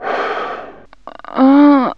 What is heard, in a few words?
SEXVOX; X-Static Goldmine 1